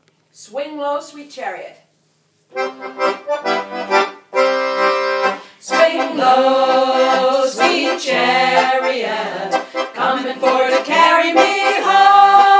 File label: Swing low, chorus